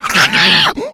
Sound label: ignite, infected male; Left 4 Dead 2; npc